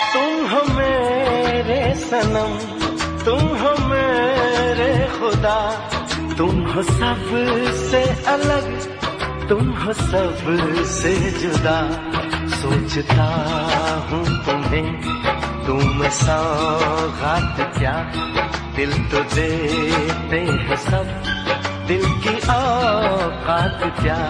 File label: Hindi Ringtone